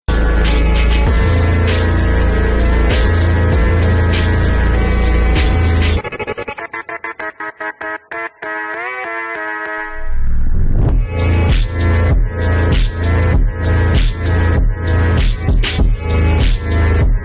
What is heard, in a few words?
slow phonk